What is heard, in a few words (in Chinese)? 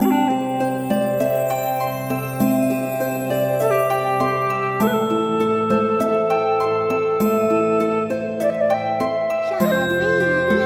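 舒缓轻快, 异域风格 BGM 神秘轻快; 奇剑仙缘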